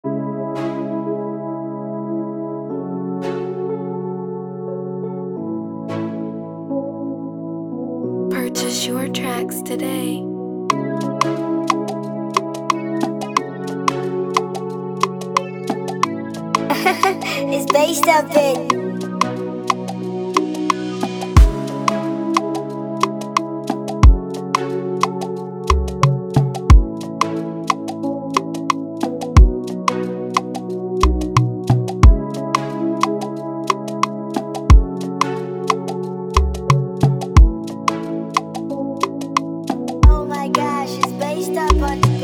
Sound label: Free beat 90bpm E major